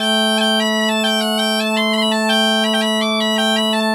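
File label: bagpipe 160, Celtic Flavours; USB Soundscan vol.42; AKAI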